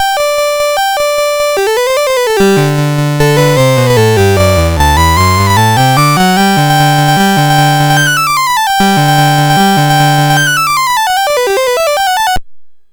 Allegro, MOS6581R4; Allegro 16

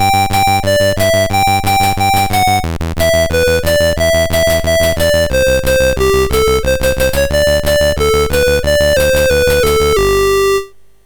Blues, MOS6581R3

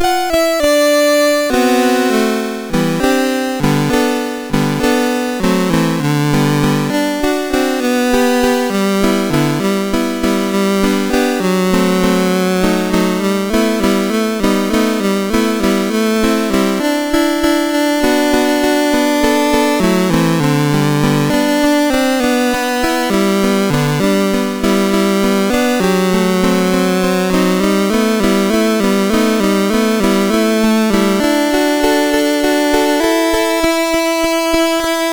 mus MOS6581R3

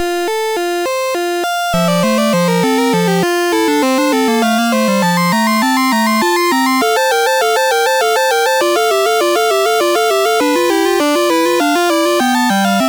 Invention in F, MOS6581R4; Yewchuk Bob; J S Bach; BASIC

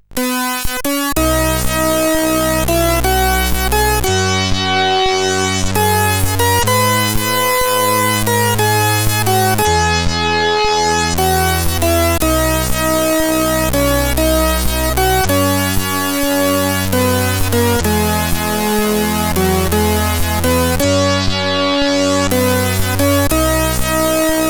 sid, CSG8580R5

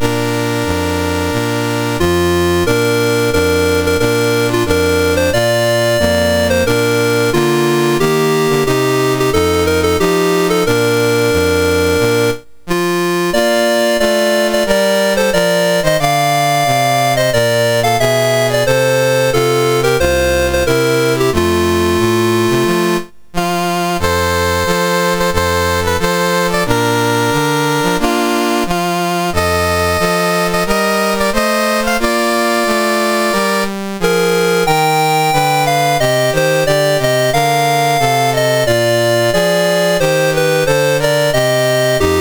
MOS6581R4, National Anthems; Malagasy Republic

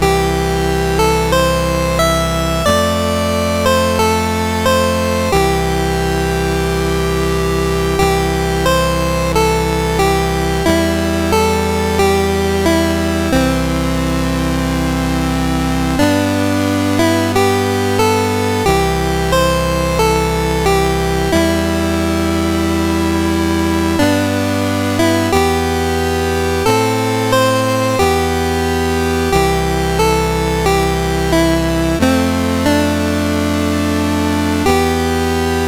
Tibet, National Anthems, CSG 8580; CSG8580R5

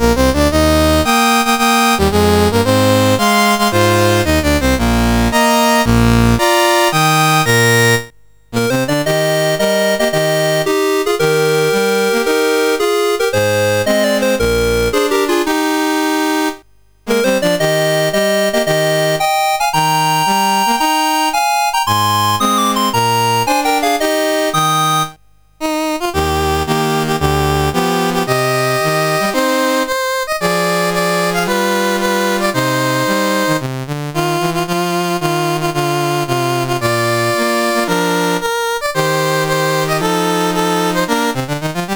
Dominican Republic, National Anthems, CSG8580R5

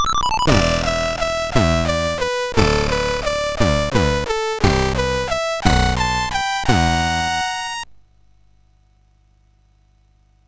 sid MOS6581R4; Dark Lord

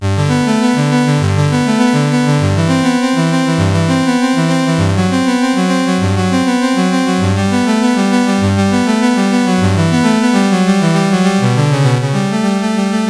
Prelude from Suite I for Solo Violincello; CSG8580R5

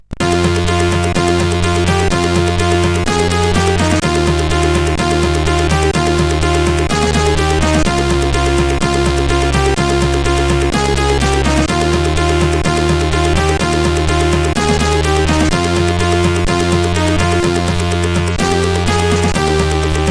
Intro, CSG8580R5

